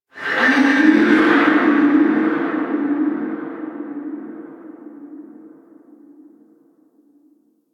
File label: Stadium Infested Medium